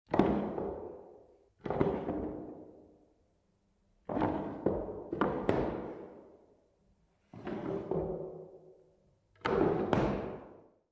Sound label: Bathroom Cabinet Dra, drawers